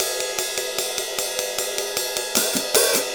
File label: Ride and Hi Hat